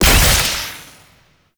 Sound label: Damage Zone Place; Gameplay; Warframe; Nineteeen Ninety Nine; PvPvE